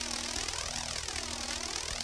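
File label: alarm damaged loop; electrical sparks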